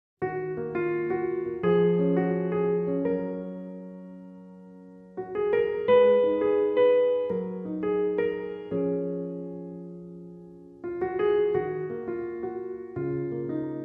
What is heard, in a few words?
Nice Piano Drama